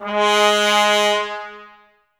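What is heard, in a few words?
trumpets G#, brass; Miroslav Vitous; Woodwind Brass Ensemble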